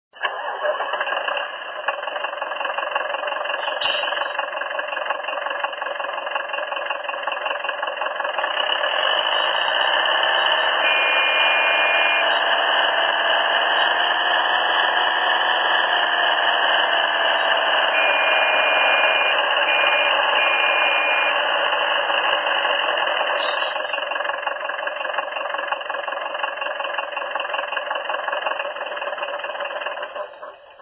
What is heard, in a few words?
Unimog